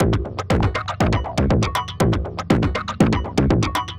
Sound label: Tech Beat 120 fx, techno; musicradar; 120bpm; Beats